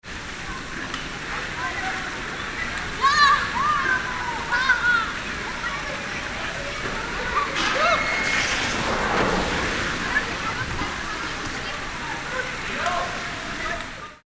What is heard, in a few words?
open air bath waterslide